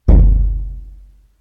Japanese Drum

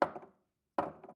Glass Cup On Wooden Table

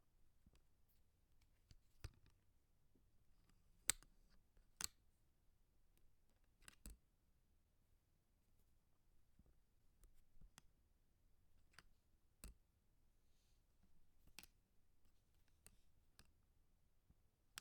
Opening And Closing Spectacle Temples

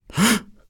Startled Gasping For Breath

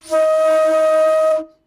Flute E2

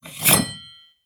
Knife

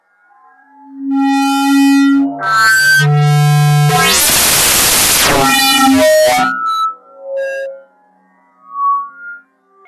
Feedback

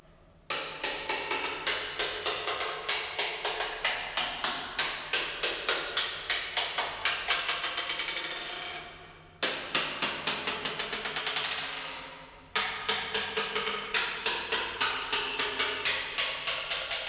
Marble Ball Staircase

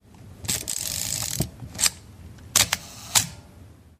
Camera Shutter Mechanical 4s

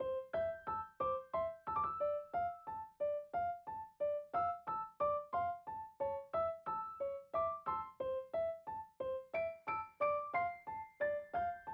Piano Loop

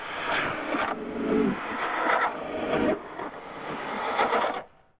Ghost Child

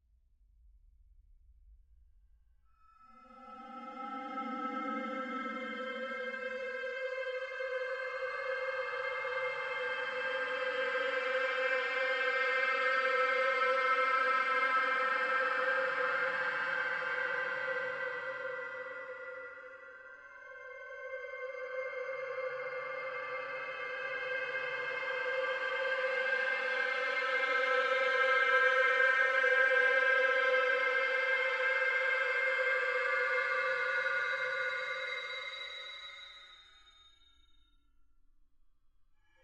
Drone

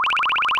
Pile Of Coins

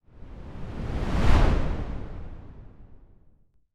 Swoosh

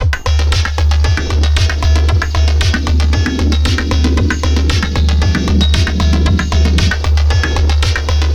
115 Bpm Stab Loop

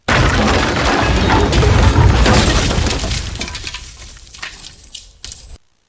House Collapse

